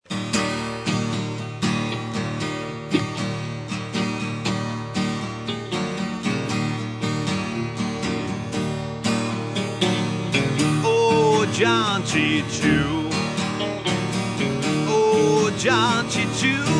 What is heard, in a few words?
song